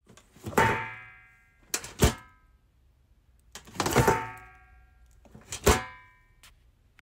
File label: trash can